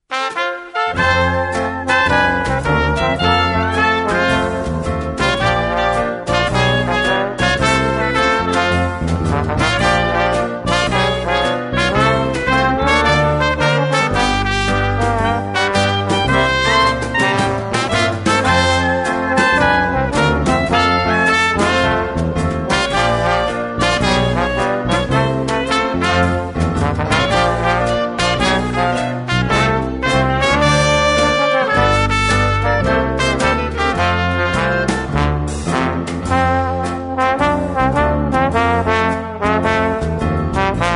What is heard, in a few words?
blues